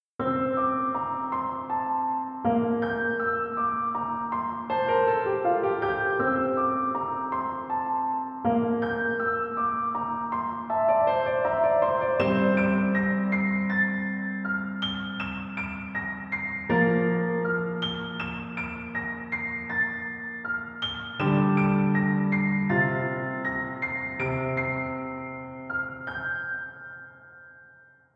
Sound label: Piano; OpenWorld